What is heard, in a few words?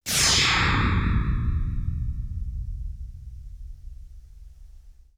Zap Synth, Sci-Fi; Sound Design